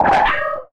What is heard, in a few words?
Multimedia Internet CD-Rom Flash Radio Element